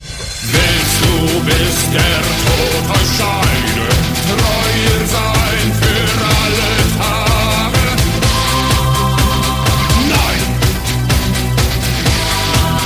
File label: Ringtone metal